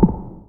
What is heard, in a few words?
error